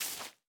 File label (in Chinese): 大型垂滴叶踩踏声